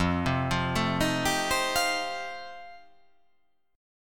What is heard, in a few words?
F 9th F, chord